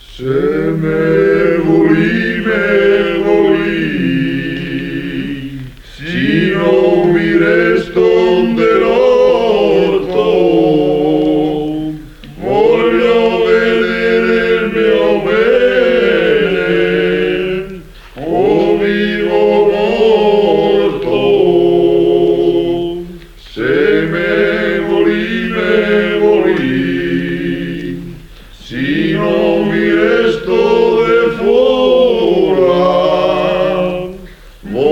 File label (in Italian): coro; basso veneto; se me vulì me vulì